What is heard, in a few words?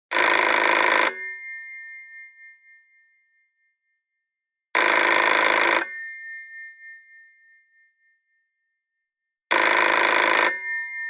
alert